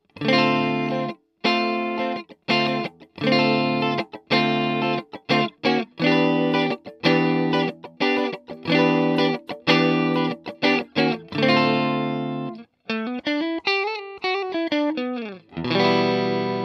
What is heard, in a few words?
STRAT CLN